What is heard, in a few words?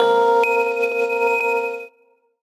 VIB WHIZ, Gbm; Rawcutz - Nu Forms